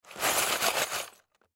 Box Package Shake, Box Package Shake Broken Pieces; Shaking Sound Effects; Foley; Objects; Blastwave